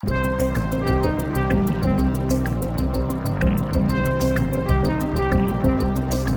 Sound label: Electro Cat, Time-Stretching